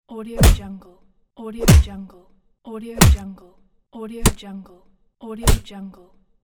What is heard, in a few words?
punch